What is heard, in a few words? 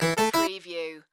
Casino Slot Machine Harpsi